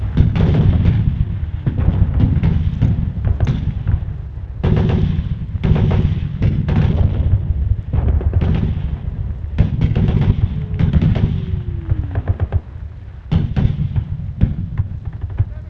Amb Destruction

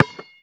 basketball dribble; Modern Warfare

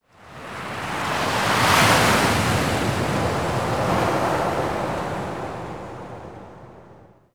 harbor occasional

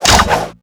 kiting whip heavy

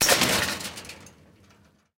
fence metal small fall; tanks; War Thunder

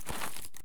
item flakes foodtake; Escape from Tarkov

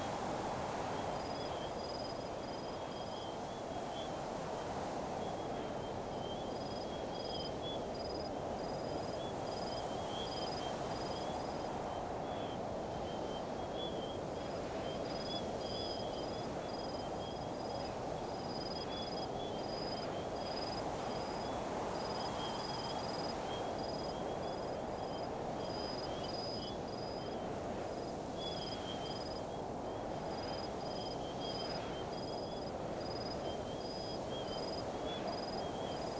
ambience desert night; Rust

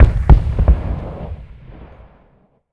bmp 30mm distant

